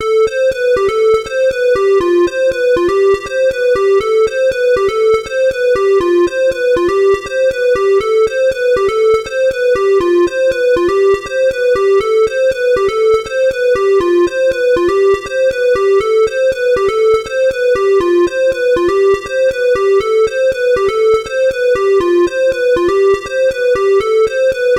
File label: lead